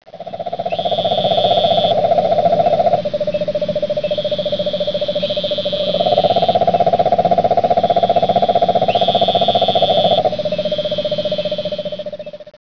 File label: giant toad; frogs toads